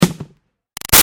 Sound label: Impact Pylon Plastic Big Snappy; Plastic (Sound Effects:Materials); Big Room Sound